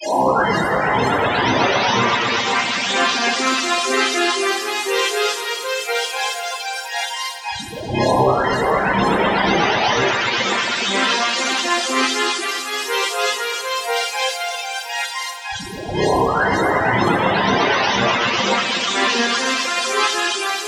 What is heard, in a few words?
Cinematic Tension Builder